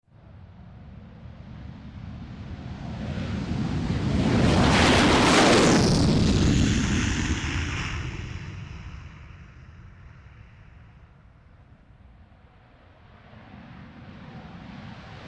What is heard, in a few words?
Two F18 Jets Take Off, f-18 hornet